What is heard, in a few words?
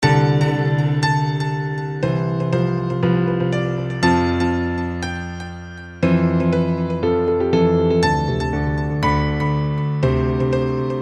major intro